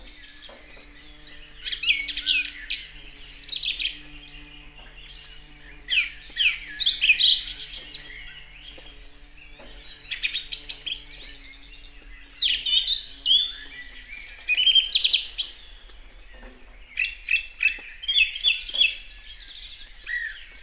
enekesrigo egerturistahaz; termeszetben

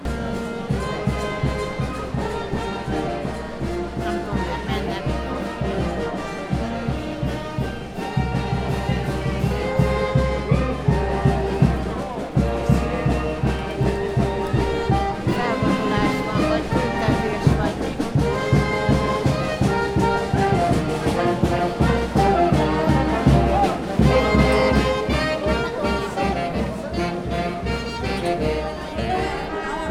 zenés tüntetés

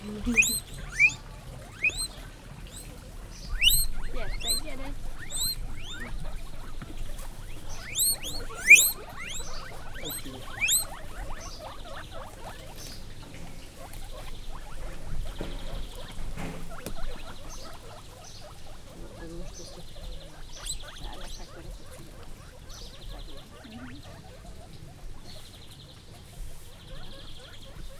kajaszagot fogtak, tengerimalac; jaszberenyi zoo 2015

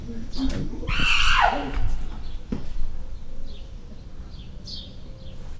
szabadban varatlanul zajos, csimpanz; gyori zoo 2014

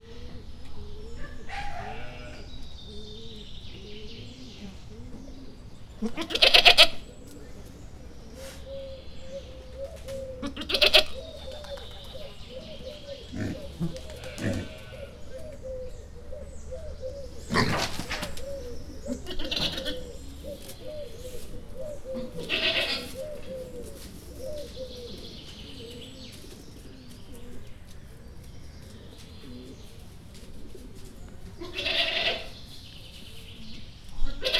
kecske mangalica gerle; parasztudvar; szegedi zoo 2011

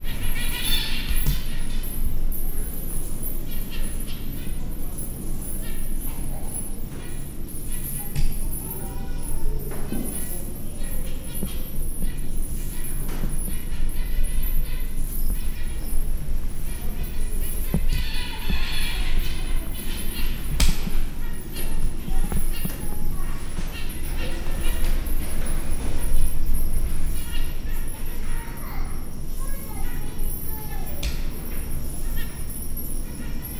mellékzöngék, zebrapinty; Győri Zoo 2014

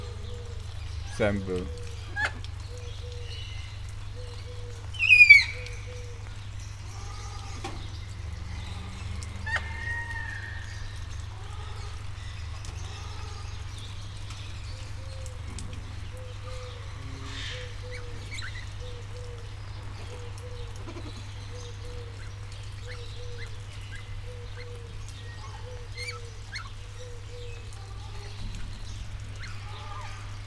szemből rózsás kakadu, eső; állathangok; nyíregyházi zoo 2010

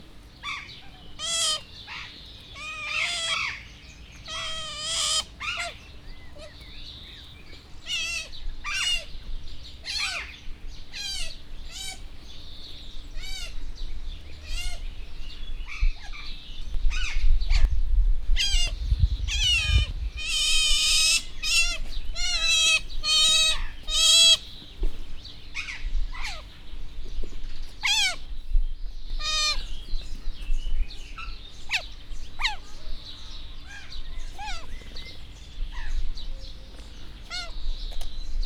várakozás szél, ázsiai kiskarmú vidra; jászberényi zoo 2015

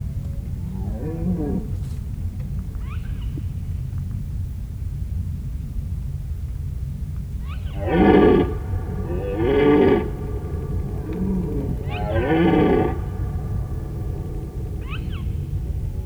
éjszaka madár ozkiáltás, gímszarvas; termeszetben

